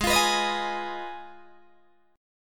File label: Ab Minor 6th, Ab, Guitar, Open D